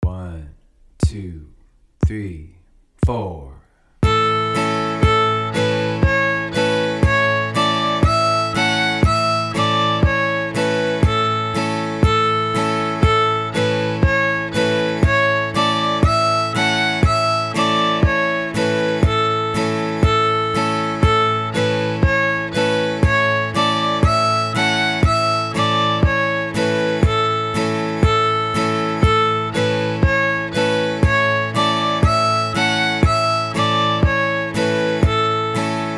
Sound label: old joe clarke variation, fiddle